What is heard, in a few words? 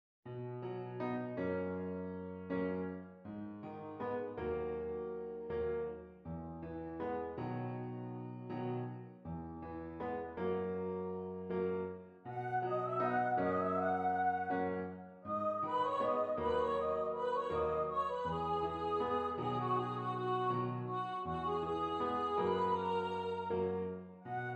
Piano Vocal